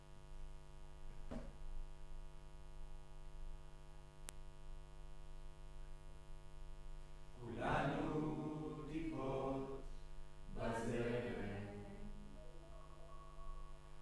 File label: Room, Choir